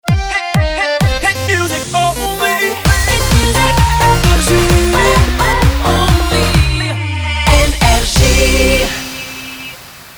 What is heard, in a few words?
jingle; NRJ